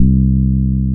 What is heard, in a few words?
Bass Note C